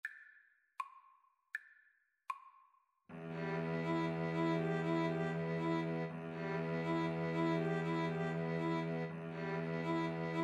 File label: 2 Violins Cello